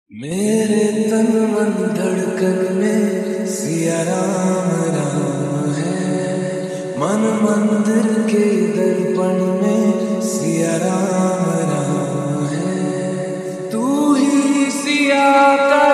Bhakti Ringtone

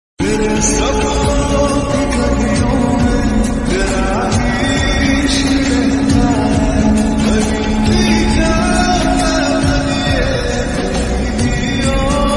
Lofi Ringtone

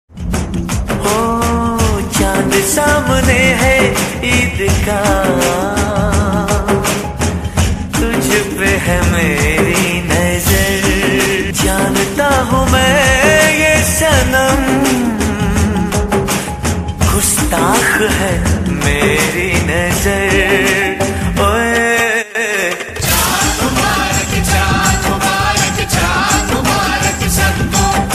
Eid Song Ringtone

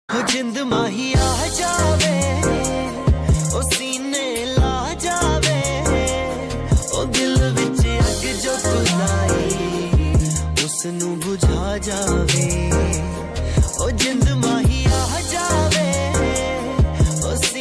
Punjabi Song Ringtone

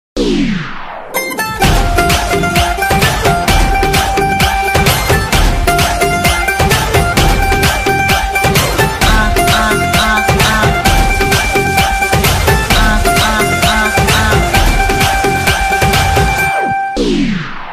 Instrumental Ringtone

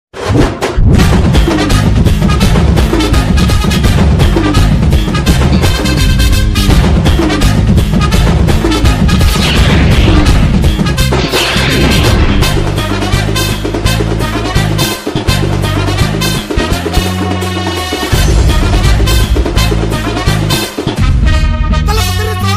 Theme Music BGM Ringtone; Petta

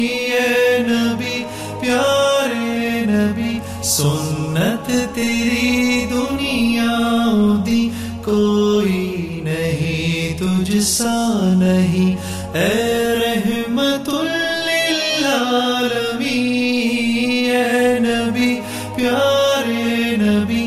Urdu Naat Sharif Ringtone

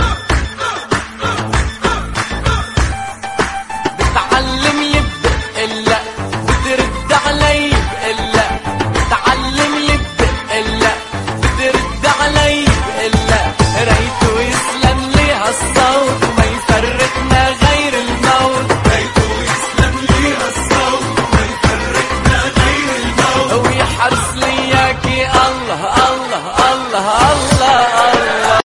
Arabic Remix Ringtone

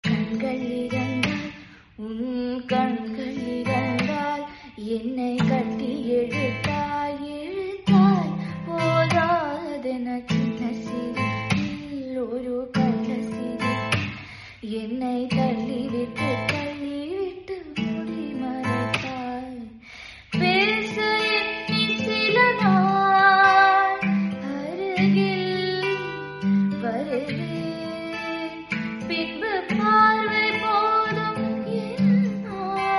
Tamil Song Ringtone

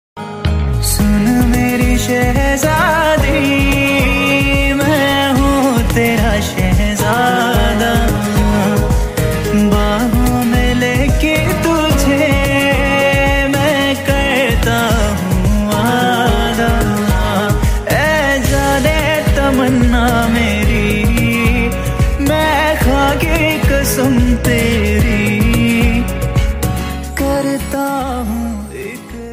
Song Ringtone

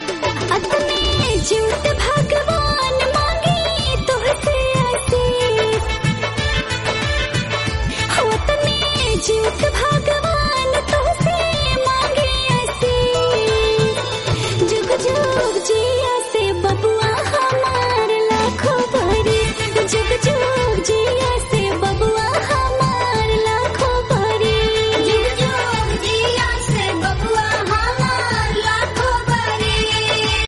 Song

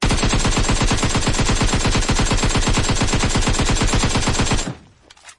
Pubg Gun Sound Ringtone; ringtones

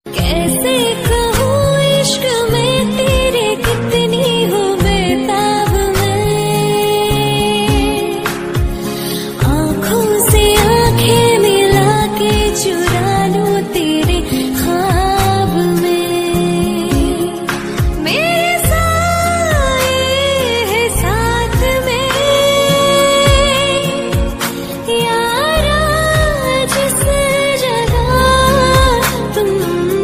Female Song Ringtone

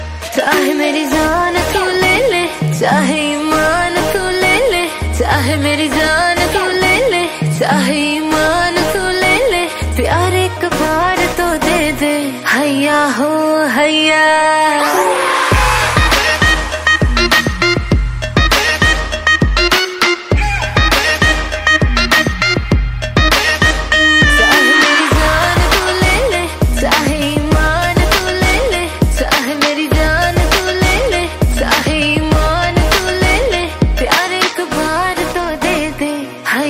Bollywood Song Ringtone

